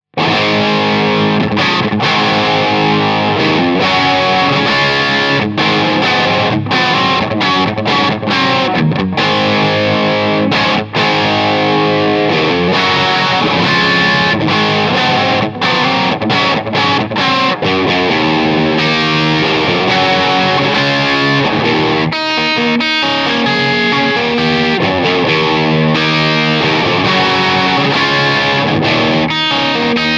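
Vintage Crunch Gibson Les Paul Custom Rhythm, guitar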